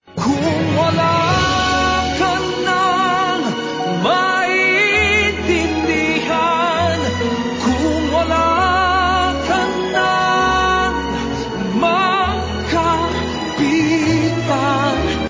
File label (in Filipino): last chorus